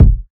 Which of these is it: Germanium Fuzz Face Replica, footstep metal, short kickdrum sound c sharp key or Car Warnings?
short kickdrum sound c sharp key